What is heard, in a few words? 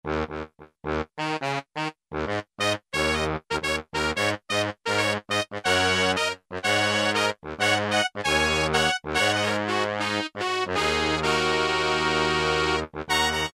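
Sax - Trumpet, Roland U-110; Factory Patch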